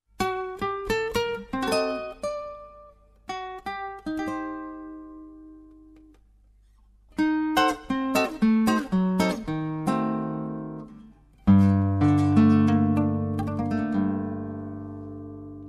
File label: Rumba, Mambo